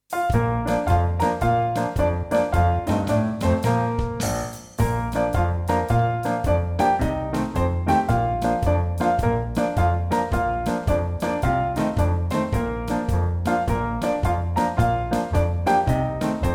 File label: Marching 6/8, Class Tap